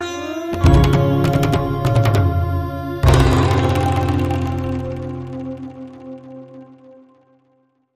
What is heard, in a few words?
Weeping Raga, Hit